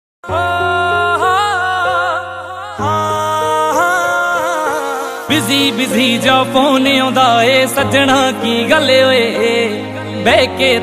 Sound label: Background Music; BGM Ringtone